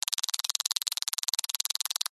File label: cartoon insect scuttle fast; zapsplat